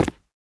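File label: player tile, footsteps; csgom; cstrike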